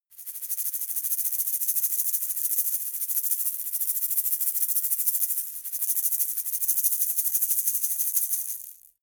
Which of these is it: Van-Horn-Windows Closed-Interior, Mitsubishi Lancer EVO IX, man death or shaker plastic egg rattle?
shaker plastic egg rattle